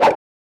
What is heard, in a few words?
Whoosh; Ed Edd n Eddy; Adromulus Pack; EEnE